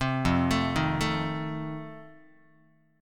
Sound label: F 7th F down Guitar; F7 arpeggiated; 3,1,0,1,1,x